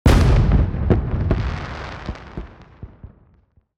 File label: firework; teardown; ps5 windows xbox series xs